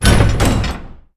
door; Shin Megami Tensei IV Apocalypse; 3DS

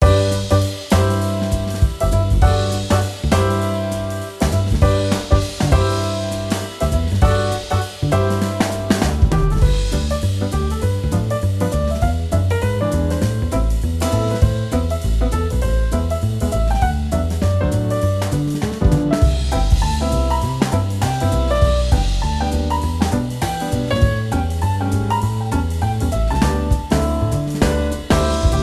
BGM EVE CHEERFULNESS; Girls RPG Cinderellife; 3DS

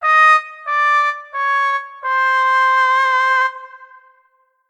failed; Garfield dmx minigame2

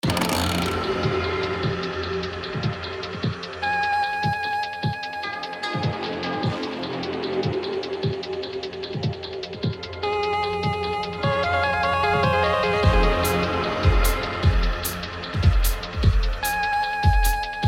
Final Boss Owl; Inukari Chase of Deception; xbox series xs; Forest 02

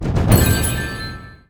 Reward; 9th Dawn III; Android

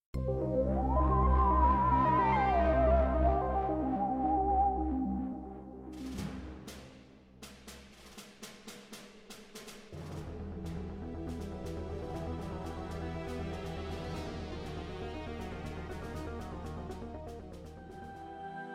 cutscene music

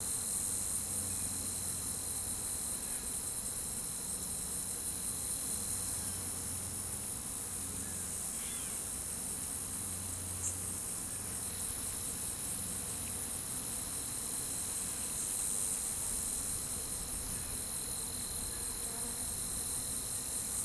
Cricket Loop

